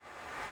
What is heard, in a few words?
Hero Shade Dash Charge; Hero Shade Dash Charge Pt 1